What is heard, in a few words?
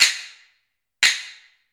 Hyoshigi fire; jidaigeki